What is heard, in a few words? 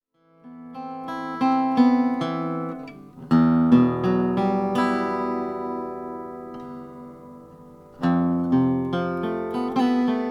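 Hopkins Monarch guitar; blonde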